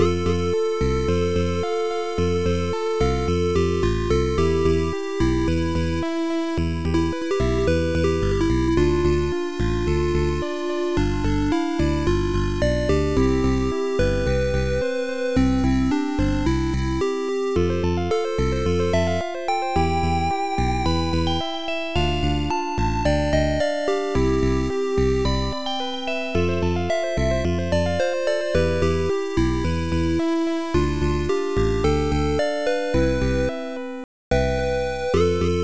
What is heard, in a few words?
Success BGM